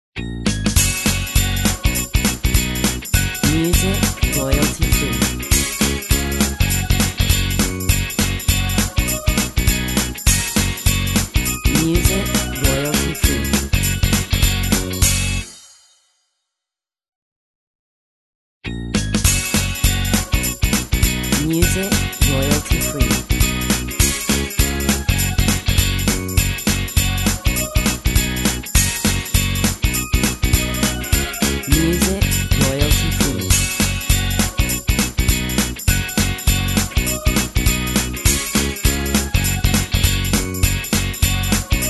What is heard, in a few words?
Upbeat Corporate